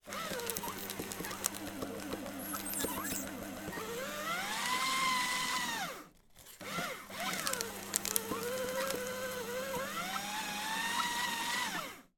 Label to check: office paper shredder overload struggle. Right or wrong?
Right